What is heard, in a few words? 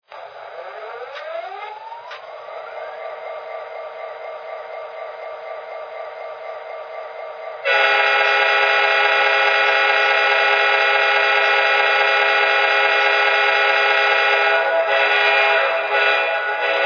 f40ph